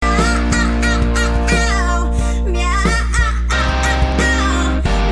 meow

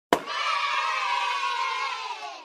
grunt birthday sound

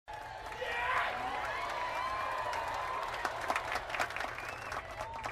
yeeaah guy and applause; eric andre show

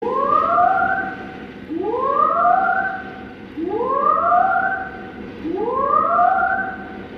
warning alarm

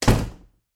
hard door close

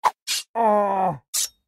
swipe swipe slam

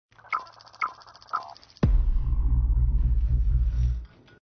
lion drone sound effect

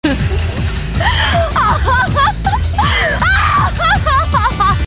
ahahahah

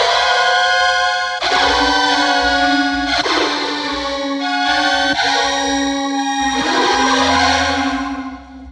satanic organ

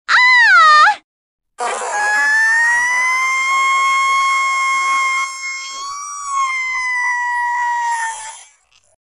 female scream

